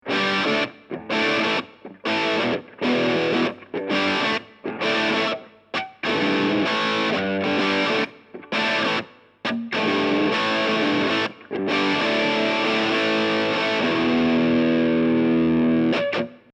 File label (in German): dist steg